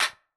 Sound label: clap